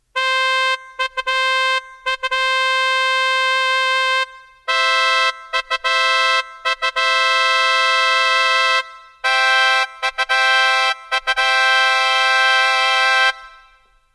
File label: fanfare; anime